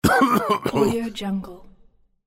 old man cough

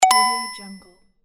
digital coin

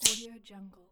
scissors single cut

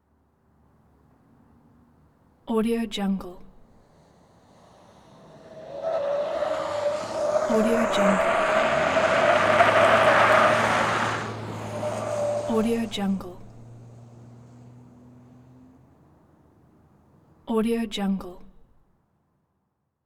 truck suv accelerate tight corners squeals

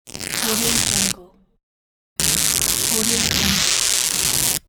tearing clothes

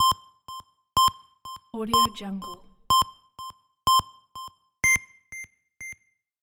8bit countdown delay

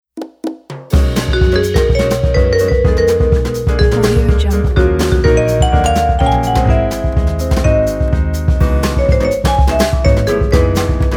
happy spring jazz

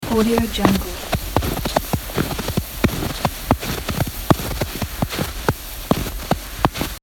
walking slow on fresh snow

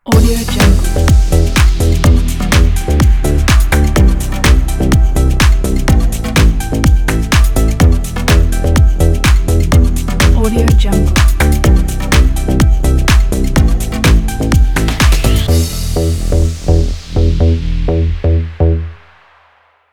fashion house logo

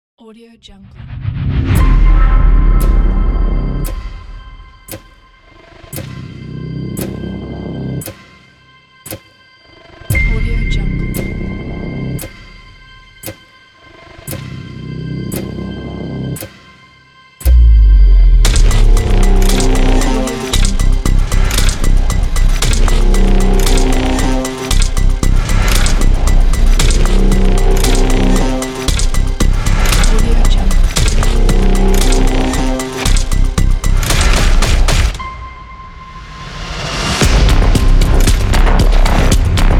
dark teaser

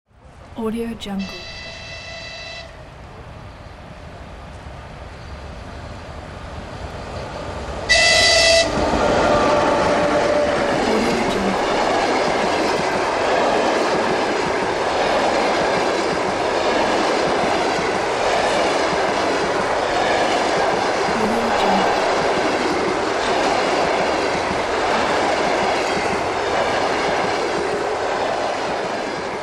train horn

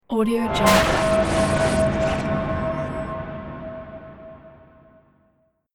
magic spell big energy blast

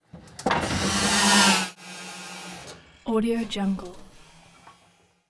engines motors

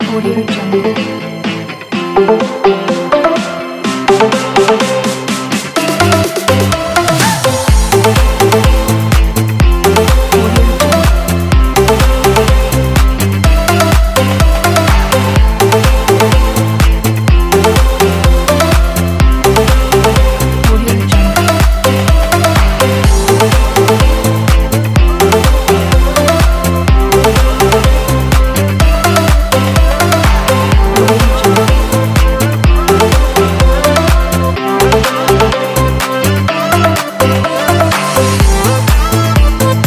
fun summer

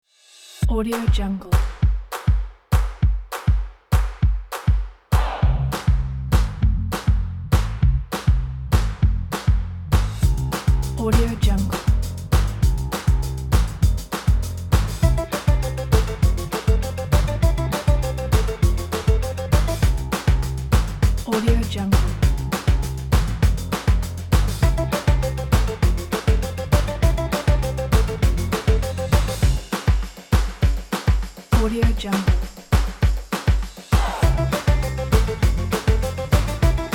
quirky happy

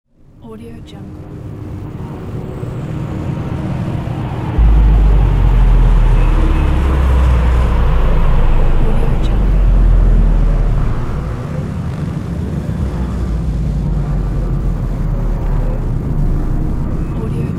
futuristic ambient; mscege

